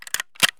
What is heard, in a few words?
saiga stock close; Escape from Tarkov